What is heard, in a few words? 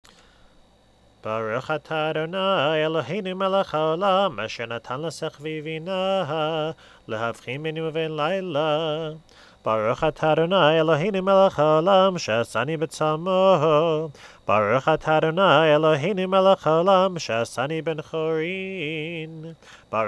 Birchot Hashachar simpler